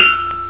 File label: hammer